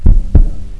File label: heal heartbeat